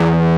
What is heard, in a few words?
E-1, GUITAR; AKAI S-Series CD-ROM Sound Library VOL-8